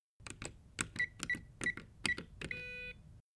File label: Alarm Keypad Entry; hi-tech-various